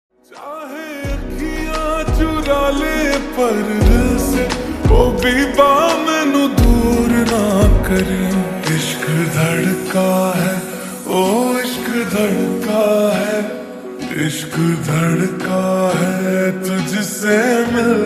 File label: Slowed Reverb Ringtone